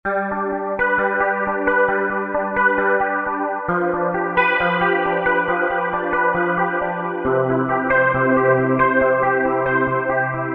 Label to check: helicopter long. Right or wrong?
Wrong